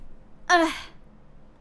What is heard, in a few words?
Hurt, human male villager; customnpcs